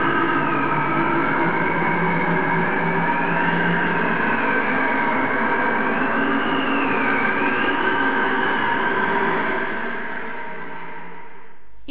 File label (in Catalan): vent